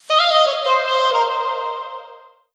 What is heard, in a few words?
phrase Bbm, Vocal; Slate Digital Omnivox Vocal Sample Pack